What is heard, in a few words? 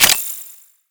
Mineral Pickup; UI; Pickups; Warframe